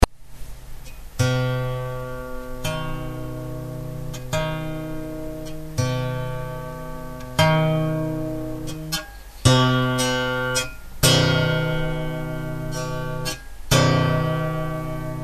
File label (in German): kl terz, musik